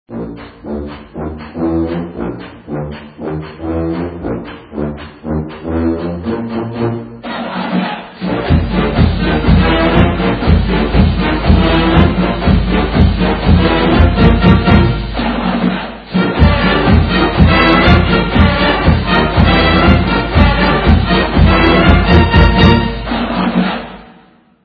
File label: Tuba Cheer